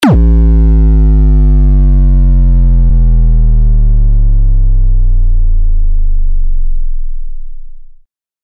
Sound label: Power Drain